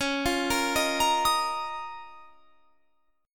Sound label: C sharp 6th Add 9th C sharp down; C#6add9; arpeggiated